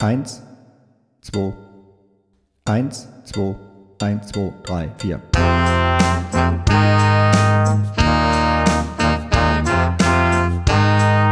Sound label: Tutti